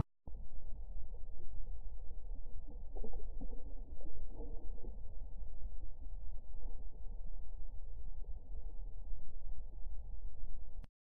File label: woodpeckers